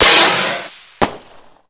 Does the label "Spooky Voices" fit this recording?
No